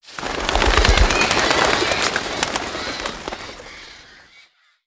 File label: Parrot Cloud